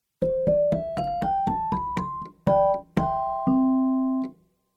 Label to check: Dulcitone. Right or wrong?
Right